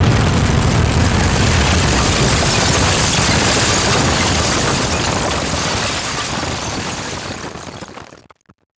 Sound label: Title Transition Glass Element; Production Element